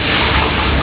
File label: SHOWER, BATHROOM; EFFECTS